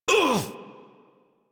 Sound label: Pain, Adult; Warframe; New War; Adult Operator